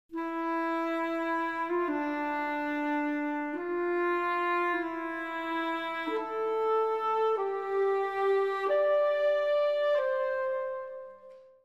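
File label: melody; Myst III Exile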